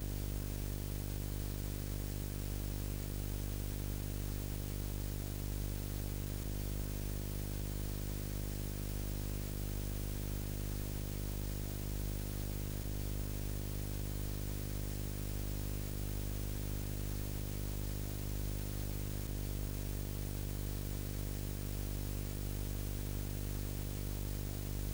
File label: White Noise Buzz; GlitchNoise